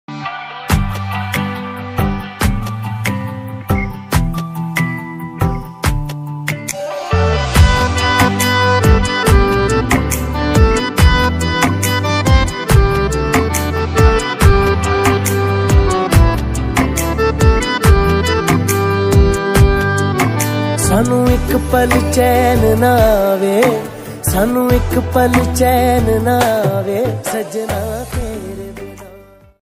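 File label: background Tone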